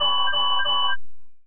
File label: ui quest spawn wave